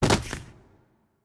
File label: Slave Fall